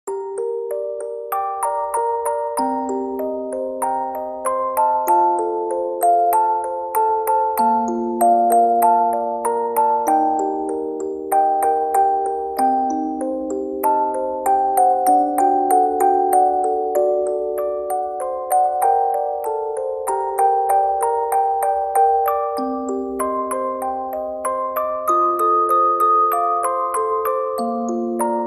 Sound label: Music Box